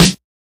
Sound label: 00s shiny steel snare drum g key; snare drum samples